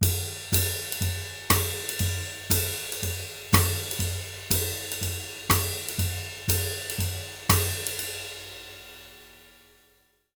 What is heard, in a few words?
120 BIGBAN; Univers Sons; Jazzistic